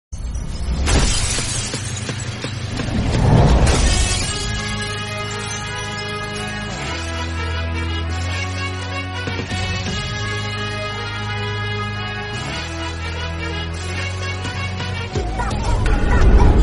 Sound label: Hindi Ringtone